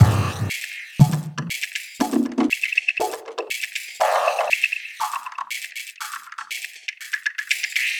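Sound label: Loop, beats; Sonic Foundry (Sony Creative Software); Esoterik Beatz; Mecan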